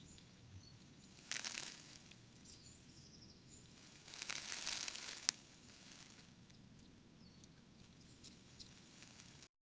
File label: leaf falling; wedding